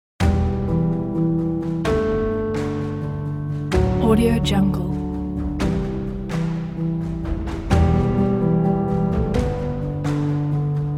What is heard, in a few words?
emotional music